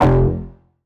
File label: Bass F, Bass Synth